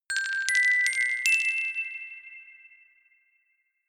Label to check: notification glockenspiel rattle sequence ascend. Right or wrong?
Right